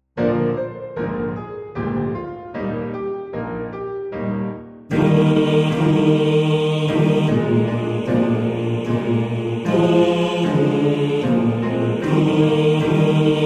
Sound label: Bass; Santa Lucia; Bass 2